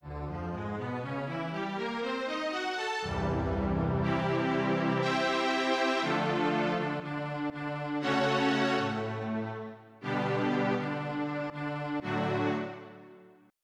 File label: Syn Strings; sblive